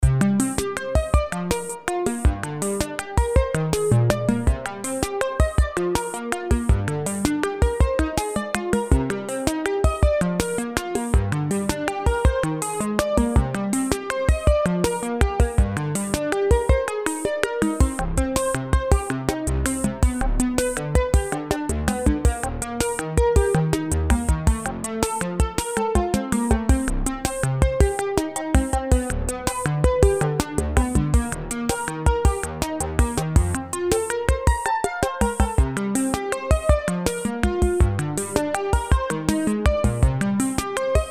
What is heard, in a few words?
Palmer Mod Clav; OPXPROII; opxpro2; Reloads; Neil Palmer